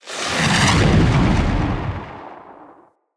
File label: Lightning Blast Cast; Enemy; Abilities; Nimrod